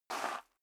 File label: step high heels gravel light slide short; schritte; Footsteps; menschen